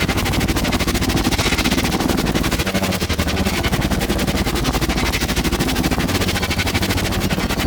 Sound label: Arch Gun Beam Loop; Thano Tech